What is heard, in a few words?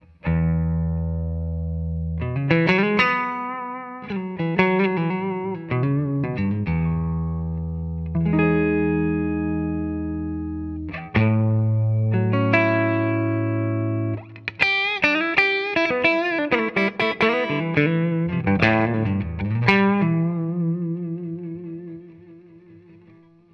Clean Strat Neck pu vol 11 treble 13 middle 12 bass 12 open back cabinet